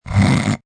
Snort Harsh, Snorting, male, harsh, short (human voice); Sound Effects:Human; VoiceBox